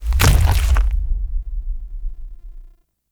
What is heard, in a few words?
Infested Hub Vendor Emerge Hand Foley; Props; Warframe; Infested Eris